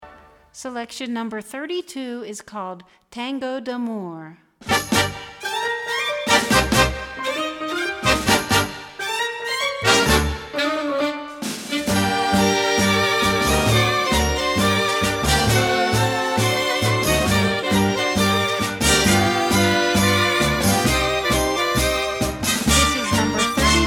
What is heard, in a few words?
Tango